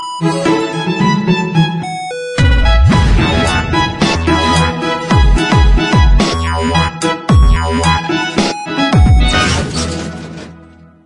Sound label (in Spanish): el verano de vivaldi version electronica